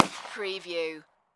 Pistol S W P99 40 Cal Single Shot Exterior At 45ft